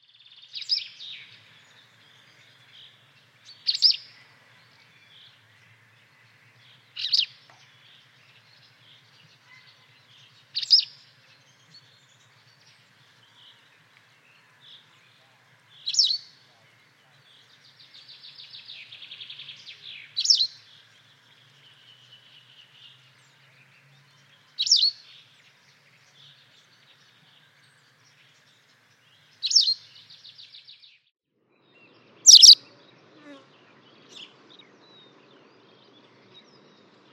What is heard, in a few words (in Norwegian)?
linerle; fugler